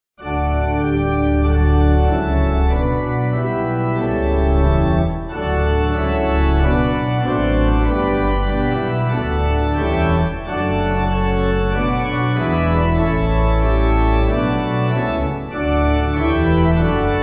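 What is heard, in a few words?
Sanctus Pipe, Pipe Organ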